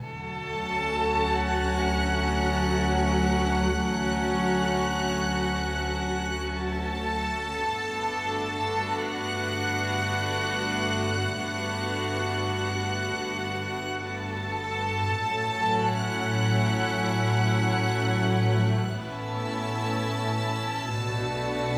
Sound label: philharmonic strings; wavetick sounds